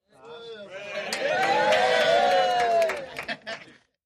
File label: 100 People Applause S; medium